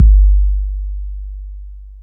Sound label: BD, Dance Mega Drums, HIP; 90 s Sample CDs; Best Service